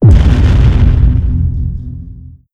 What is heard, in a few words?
adv kinectic hit, weapons; Stellaris